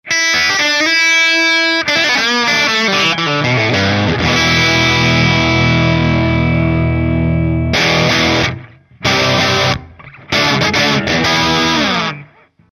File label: Amplitube Orange OR120